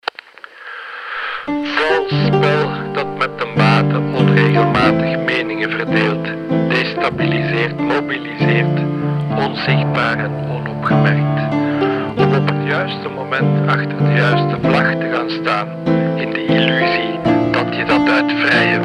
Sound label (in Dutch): Vals spel; Wow Flutter